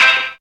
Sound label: synth c, Dance Synths, Stabs & Hooks; Best Service ProSamples vol.37